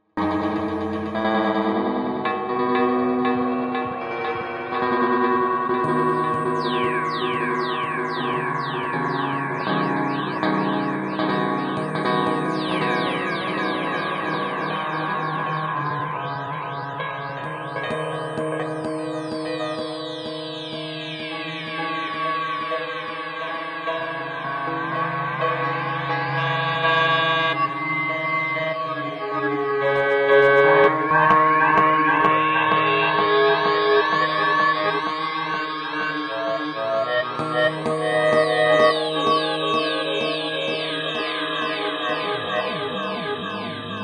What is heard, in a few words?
Underwater